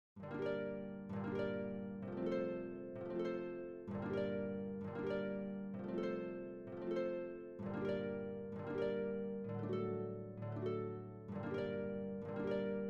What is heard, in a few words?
recercada ACCOMP AUDIO; ortiz recercada 1